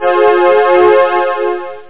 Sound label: cafe; OctaMED SoundStudio V1; synth misc